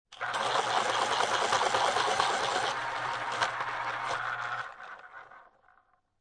Pencil Sharpener Electric, Office, Pencil Sharpener, Electric, Sharpening Pencil